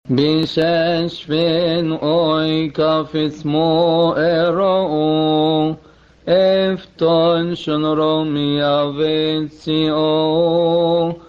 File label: Jonah Wednesday Gospel Response